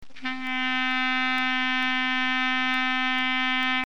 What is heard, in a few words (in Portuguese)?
clarinete si